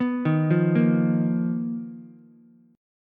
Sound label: B Major 7th Flat 5th B down Ukulele; BM7b5; arpeggiated; JGuitar